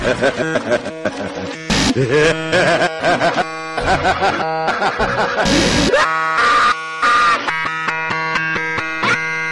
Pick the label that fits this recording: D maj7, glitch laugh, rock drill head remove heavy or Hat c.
glitch laugh